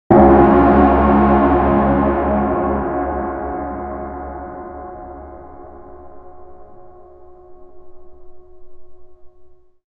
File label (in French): gong satanique angoissant